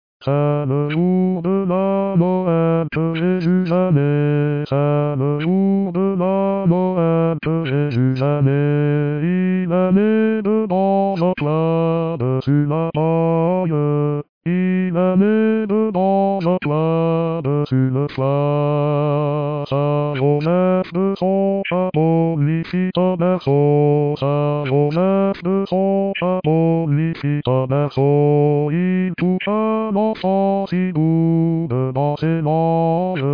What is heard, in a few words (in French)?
Basse, Voix; C'est le Jour de la Noël - Basse